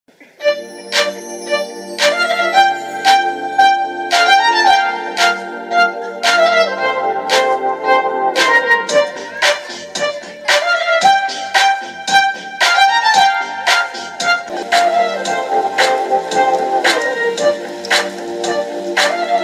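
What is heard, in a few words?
love feeling background music ringtone kannada; ramachari; radhika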